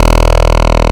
PPG 2.3; DIGITAL1 HYB

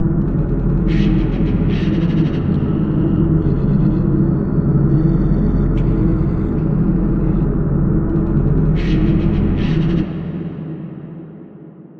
drone underworld F

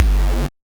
Noise; musicradar; 8-bit bonanza

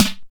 snaredrums, techno; Techno ID; single-drums

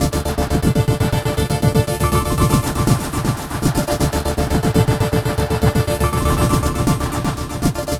Synthetix, Processed Percussion

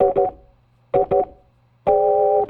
Organ, 70s Analog Funk; Sonic Foundry (Sony Creative Software); What it Is!; Slinky's Place

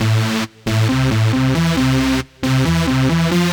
136bpm, future rave samples

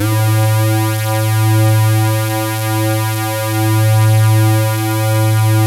SAW, Synth and Organ

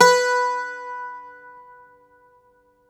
IRISH, Old World Instruments

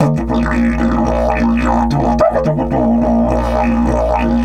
108, Didgeridoo

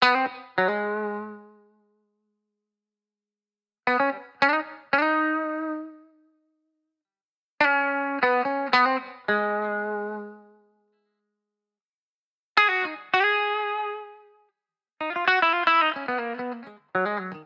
Guitar Bpm110 Key G#, 70s Guitars; Fox Samples - Back to the 70s Guitars

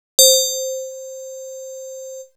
Perc; Coldcut's Kleptomania VOL1

